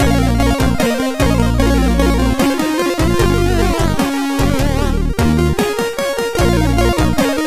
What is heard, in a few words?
Blash, MOS6581R4